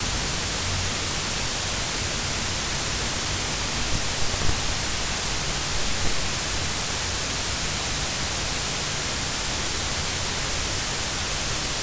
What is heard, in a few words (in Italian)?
Strofinamento su microfono